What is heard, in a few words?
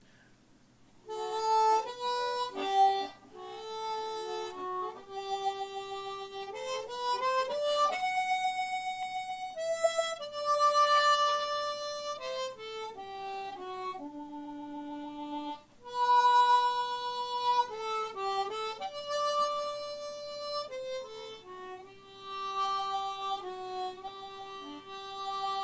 harmonica; taimse